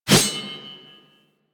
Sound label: Sword Swipe; Warframe; Weapons; Melee; Whooshes